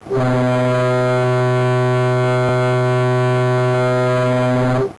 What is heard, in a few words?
ship horn; Simutrans; Pak128.Britain-Ex